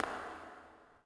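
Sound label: echo run, footsteps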